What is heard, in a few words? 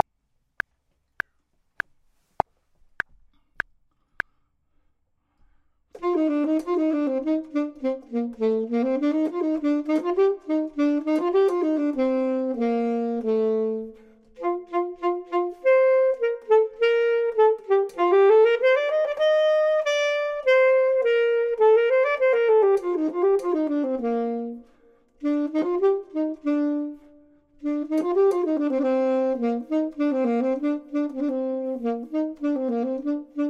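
bottom, Saxophone and Clarinet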